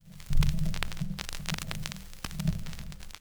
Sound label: Record Start Old, Vinyl Record; Record Noises